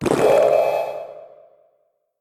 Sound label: Potion drink